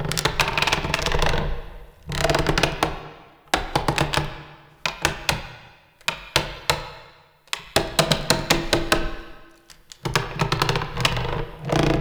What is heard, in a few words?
Tree Withered Loop; Shadowgrapher; Warframe